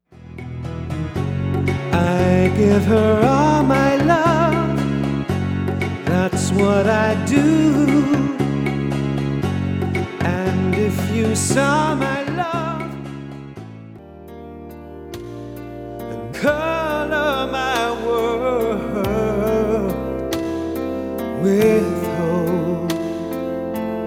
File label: Contemporary ballads